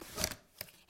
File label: scroll